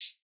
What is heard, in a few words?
Electro hihat; Reference Beat